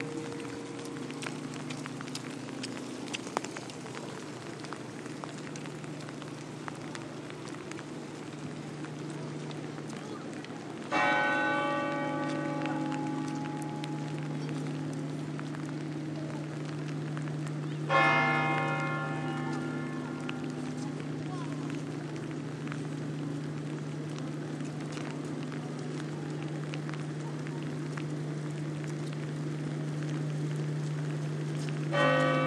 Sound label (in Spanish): campanas de la Almudena bajo la lluvia; en semana santa